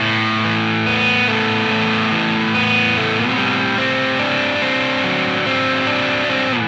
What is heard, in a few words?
Distorted Guitar; Stringed Instruments